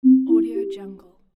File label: message